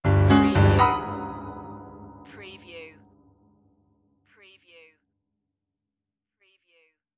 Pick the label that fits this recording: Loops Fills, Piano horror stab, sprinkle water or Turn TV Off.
Piano horror stab